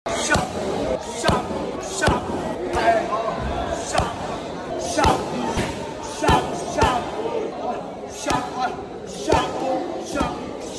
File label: Muay Thai; ورزش موای تای